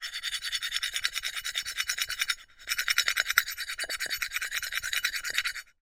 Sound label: foley glass scrapes sharp object